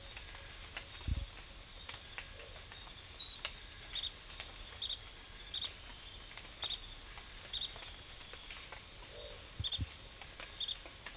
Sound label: Cochranella adenocheira